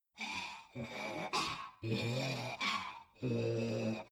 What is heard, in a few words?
zombie female idle; npc